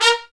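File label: BRS TPTST, brass trumpet stabs; Roland L-CD702; BRS Tps Stabs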